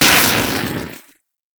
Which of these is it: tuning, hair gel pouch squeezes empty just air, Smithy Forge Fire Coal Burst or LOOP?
Smithy Forge Fire Coal Burst